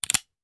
Hand Gun Foley; Sound Ideas; Guns

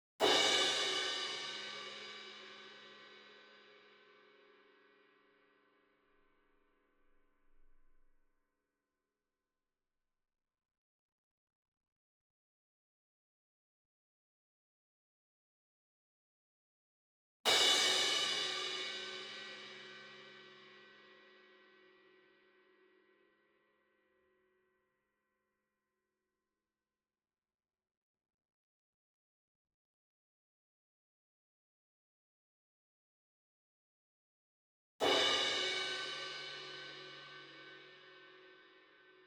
CYMBAL Crash Hit Hard Beater Far; boom library; Cinematic Strikes Construction Kit

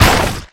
Blood Splat Gib; SFX Kit; Foley - Misc

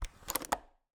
Cabin, window, latch, flip, lock, close, wide; Eiravaein Works; Vaeyan IV

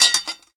IMPACT Metal Cling Bounce; mono; IMPACTS; Universal Sound FX

